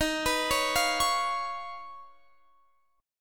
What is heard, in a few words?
Eb 7th Suspended 2nd Sharp 5th, Guitar Standard; arpeggiated; chord